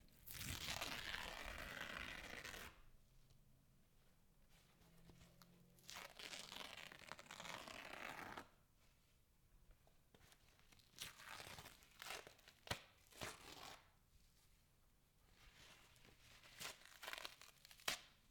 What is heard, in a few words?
Tearing Material